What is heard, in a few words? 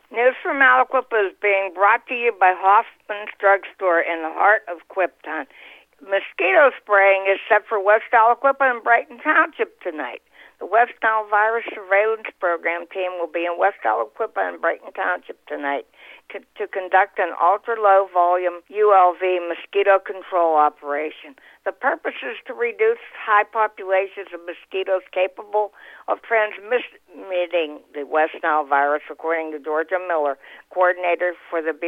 MOSQUITO SPRAYING